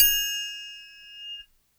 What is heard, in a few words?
Triangle Tone, Asia; Sonic Foundry (Sony Creative Software); Continental Drift Aftershocks